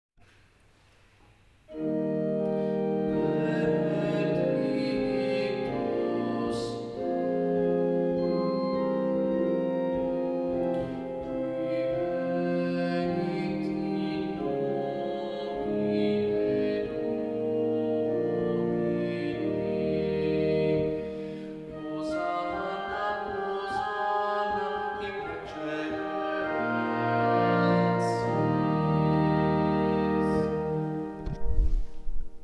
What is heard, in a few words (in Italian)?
Contralti e bassi, Coro; Benedictus; Coro Isola del Giglio; Messa di Bottazzo